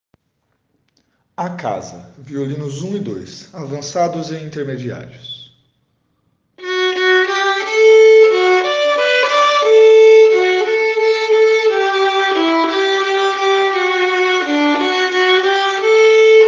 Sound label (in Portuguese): Violino 1 e 2; violino kids; A Casa